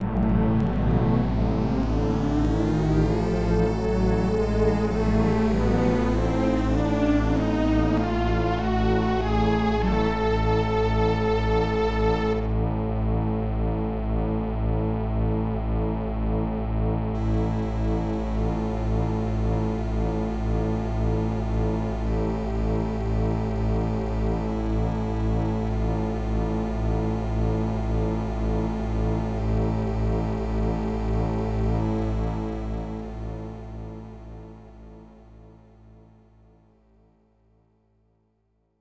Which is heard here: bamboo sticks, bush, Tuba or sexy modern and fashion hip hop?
Tuba